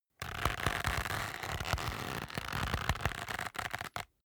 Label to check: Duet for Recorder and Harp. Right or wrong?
Wrong